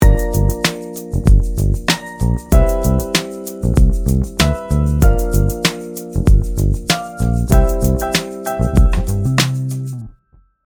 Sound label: Soul More Bass